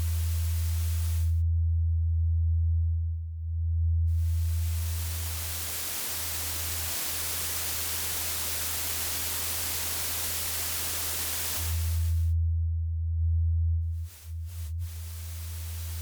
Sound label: noise; Behringer Victor